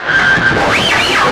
SCRAPE, Guitar; East West; Steve Stevens Guitar Samples Collection

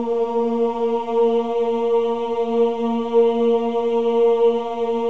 CHOIR OOS, A#3; Spectrasonics; Symphony of Voices

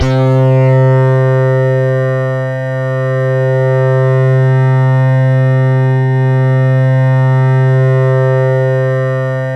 EDGELEAD, MORPHEUS

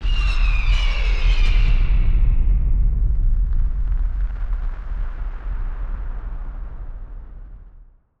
Firework FX; musicradar; bomb factory

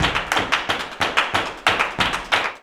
JALEO TAP, Flamenco

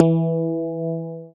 BAS TX-802; Best Service Gigapack 2

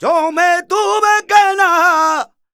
voc d#, Flamenco Vocals Male Cantaor; Male Cantaor 1

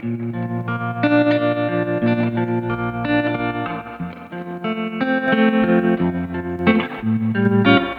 Trem Guitar, 70s Analog Funk; Sonic Foundry (Sony Creative Software); What it Is!

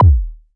Elektron Monomachine, Drum Machines

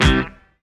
DD BAS, Disco House; Best Service Dance Mega Disco House

